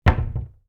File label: cabinet door close